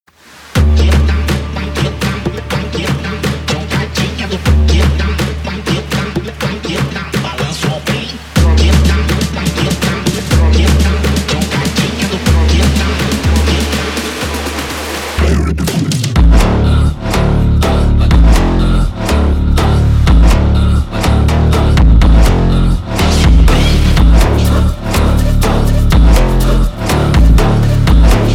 brazilian phonk

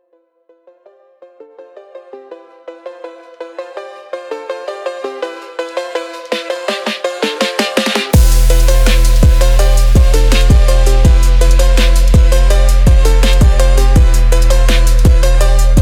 drift phonk

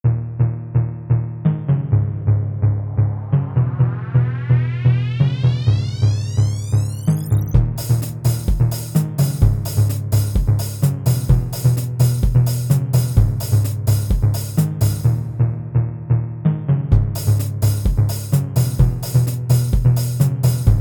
phonk

